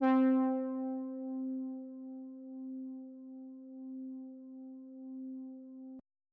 Lead

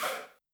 Not Nice Chants, Vox

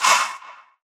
percussion shake; Percs

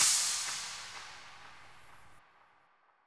hat, closed hats

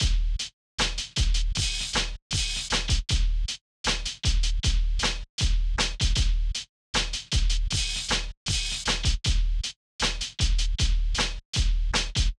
Drumloop

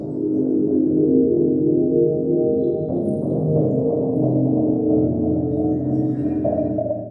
pad atmosphere 135 Fmin

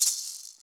Shaker

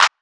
Clap

